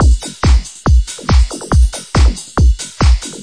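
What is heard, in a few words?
140 bpm, electro kick 4-4 techno, drum loops and breaks; 131 to 140 bpm; samples-ghost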